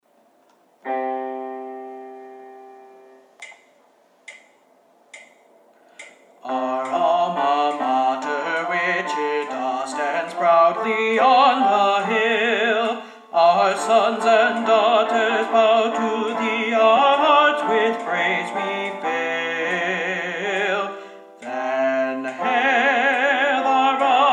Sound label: Click Tenor; Alma Mater; virtual choir